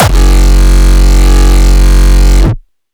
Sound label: frenchcore, kick